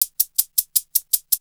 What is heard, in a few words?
Percussion; AMG - Coldcut's Kleptomania VOL-1